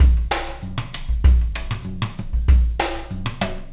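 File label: Beat, left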